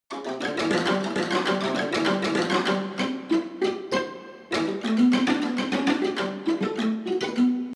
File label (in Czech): VIOLE col legno